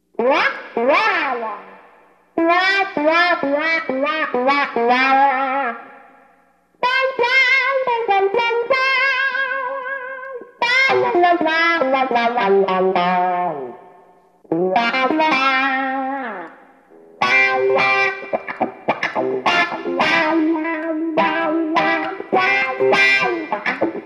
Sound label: Vintage Crybaby Replica